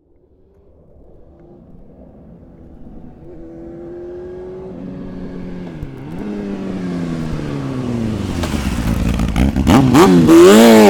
transport motorcycle honda hornet race bike approach slow rev away; fork media